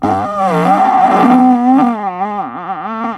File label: farts